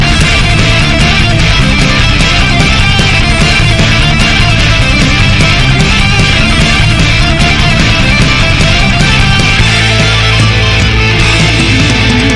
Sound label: Guitar Solo